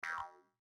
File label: start, Windows